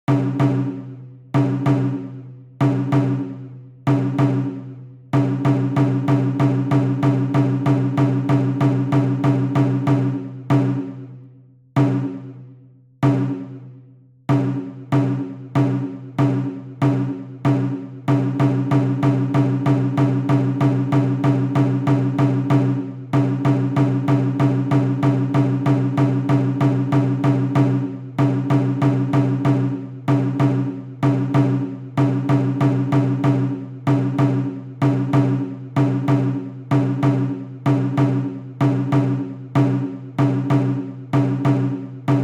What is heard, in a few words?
odori uchi group a 95bpm